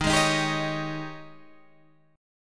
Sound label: Ab Major 7th Suspended 4th Eb